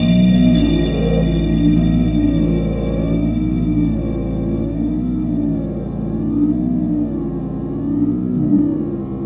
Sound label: Warble; Sonic Foundry (Sony Creative Software); Synchro Funk